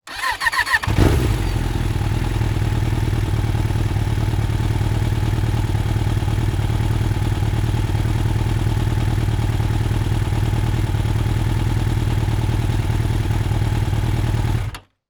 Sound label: motorcycle start and idle; transportation